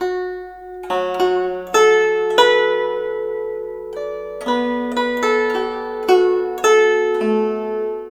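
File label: guzheng; Spectrasonics; Heart of Asia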